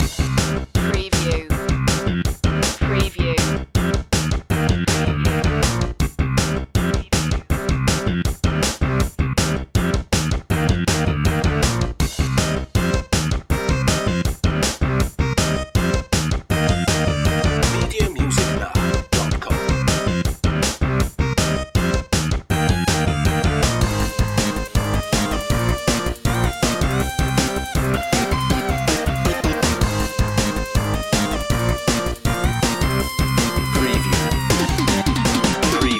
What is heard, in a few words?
retro video game racing rock loop